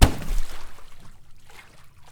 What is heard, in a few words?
water slap